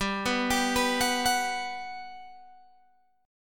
G Major 7th, G, down, Guitar, Open G

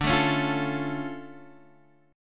D Minor Major 7th D

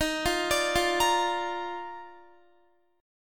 Eb Major 7th Suspended 2nd, Guitar; arpeggiated; chord; JGuitar

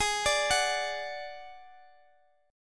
D-Major Flat 5th, G sharp

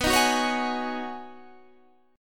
B 6th Add 9th B Guitar Standard, chord; strummed

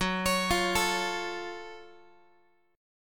F sharp Minor Major 7th F sharp Guitar; arpeggiated

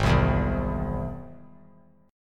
G sharp Augmented G sharp; G#+ strummed; JGuitar

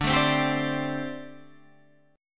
G Suspended 2nd Suspended 4th D

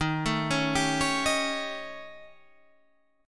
D sharp 7th Flat 5th, D sharp, down; arpeggiated; JGuitar

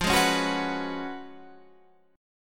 Gb Major 7th Suspended 2nd Suspended 4th

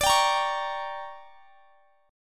D Major 7th Suspended 4th Sharp 5th, chord; DM7sus4#5 strummed

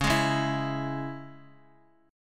C sharp Minor, Guitar; C#m; strummed; JGuitar

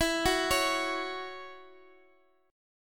C sharp Minor Double Flat 5th E, chord

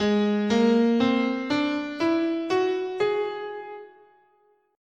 Whole Tone, scale, guitar; Gsharp

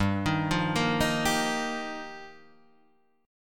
G Minor 7th Sharp 5th G, Guitar Half Step Down

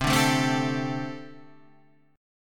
C 6th Suspended 2nd C

